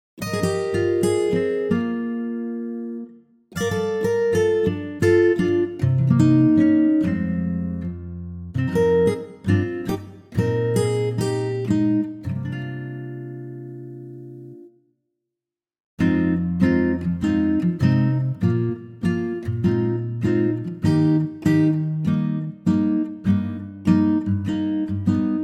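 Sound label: Eb Acoustic Guitar; key - Eb - vocal range - Bb to Eb; lightly swinging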